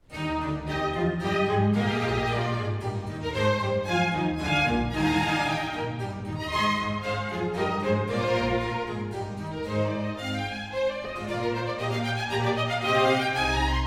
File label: Concerto in E Flat Major for Horn, Strings and Continuo - Vivace; Baroque.horn